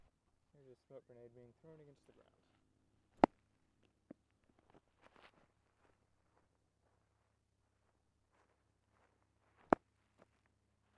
smoke grenade bounce shotgun

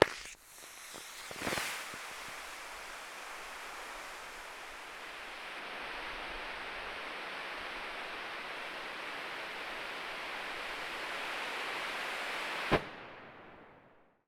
smoke gren full

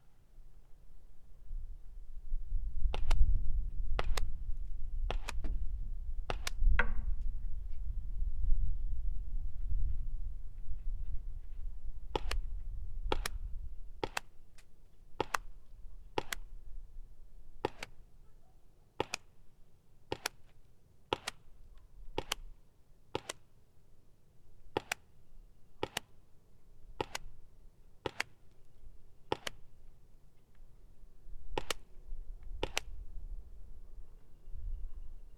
splats grass longbarrel

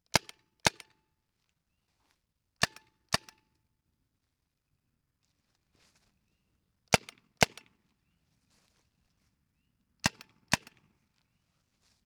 carbine 4 shots 4 dry side then back short barrel; pro carbine; 68 carbine

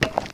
step metal, footsteps

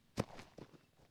step grass, footsteps

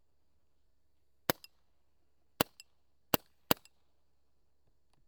automag dry quiet, guns; automag rt

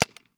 carbine empty clip; 68 carbine

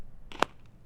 splat dirt; splat dirt clip; splats

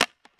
shotgun, guns; tracc new shotgun; trracer

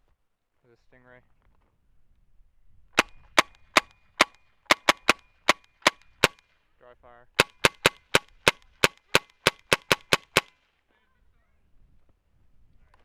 stingray raw some clip, guns

